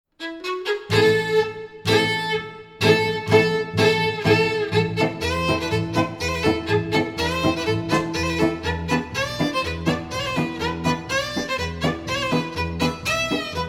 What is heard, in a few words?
Hava Nagilah Klezmer, string trio; International String Trio